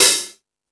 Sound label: Space Drums, Drum Machines